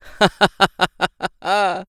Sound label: laugh; Eternal Return; ENG